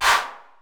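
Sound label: 808 clap; 480L; Wizoo; Platinum 24 Electronic; 480L BW 808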